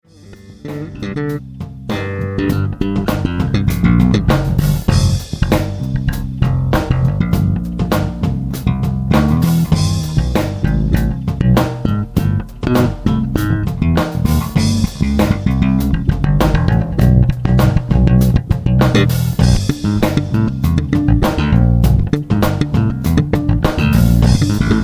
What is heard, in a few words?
Improvisation